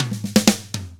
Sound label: ROCK, Drums; ILIO; Double Platinum Drums 1; 116 ROCK